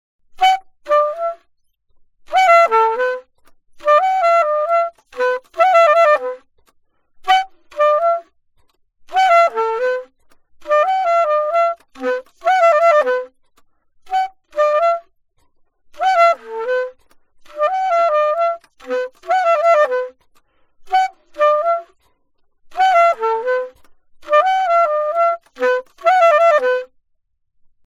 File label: altosax